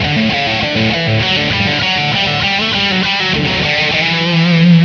lead, 100

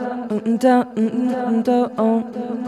Vocal Echoes

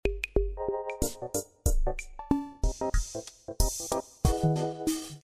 Funky Hopper F7 93

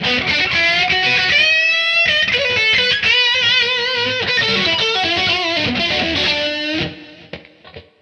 lead 122

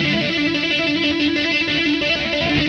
E5 134 rhythm

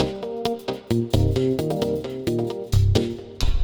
Funky 125-132 Cm7

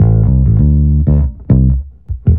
Bass D 100

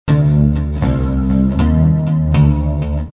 Bass Amb D, C, Eb, D 158

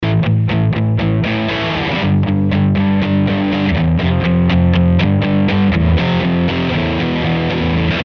Interlude Rock Solid A

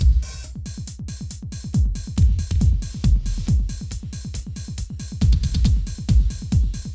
Clubbin' 138

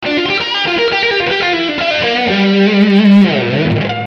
G27 119 lead

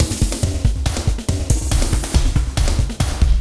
Wild Ride 140

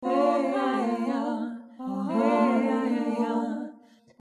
115 Ballad 2 Harmony

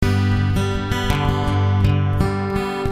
Picking G

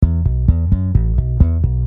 Bass E 131, Electric

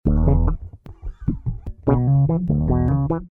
E 149 Wah Funk

